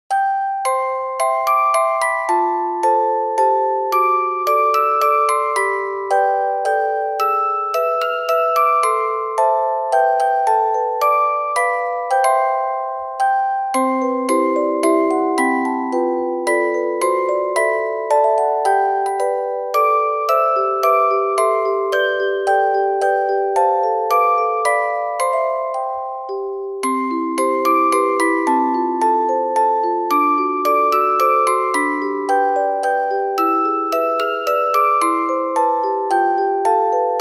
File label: We Wish You A Merry Christmas uptempo